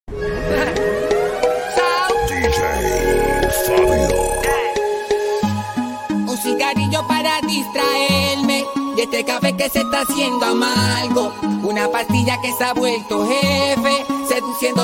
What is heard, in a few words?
Reggaeton Mix